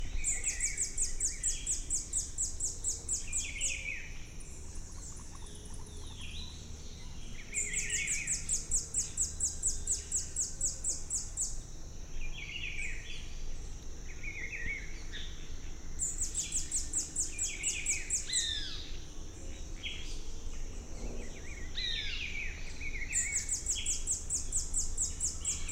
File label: Rufous throated sapphire; پرنده یاقوتی گلوحنایی